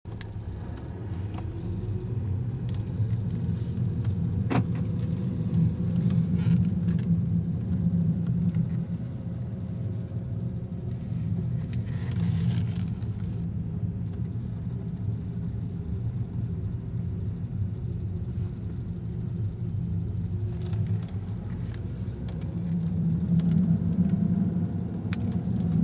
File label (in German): knarz wave, defekte; geraeuschdatenbank